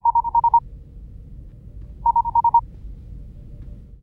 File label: incoming hail, computer